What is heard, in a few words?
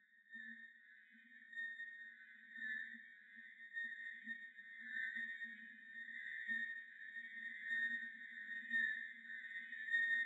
Squirrel, Garden